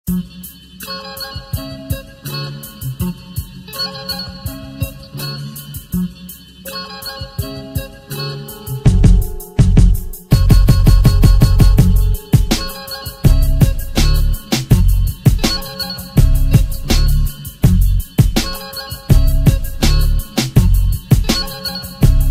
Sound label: instrumental